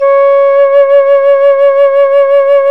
FLT FLUTE, Flt vib; Roland L-CDX-03 Disk 1

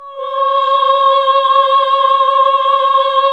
HAL AAH, Nuns Aahhh

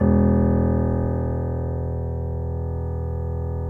PNO PP; Synclavier Sampler Library

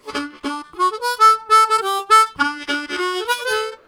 Question Vamp, Harmonica, Riffs; Zero G

